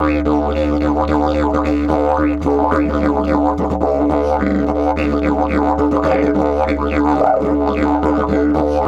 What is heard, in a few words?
108, Didgeridoo